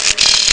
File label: telephone; Amiga Soundtracker Sample Packs